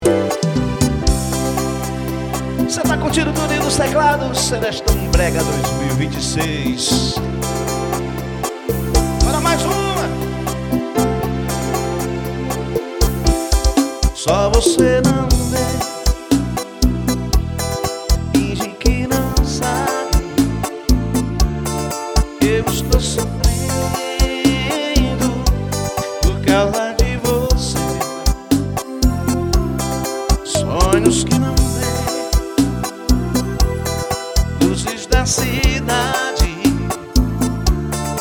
serestão brega ao vivo

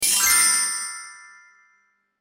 Sucessão Rápida De Moedas Douradas Pesadas, Sequência Triunfante De Sinos Ascendentes; Elevenlabs

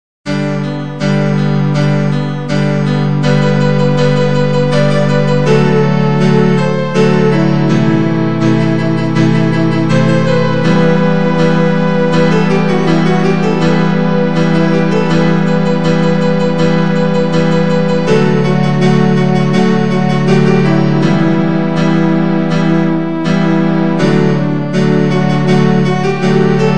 piano, musica de forro

